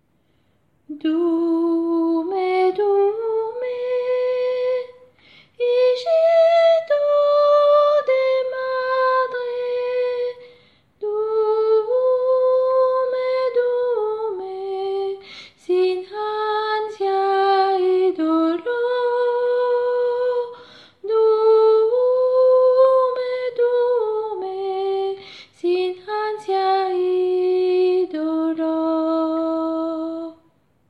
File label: soprane; Durme; 1er couplet